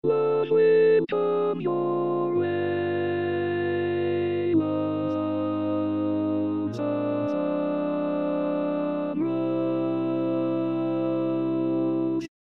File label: Tenor, Tag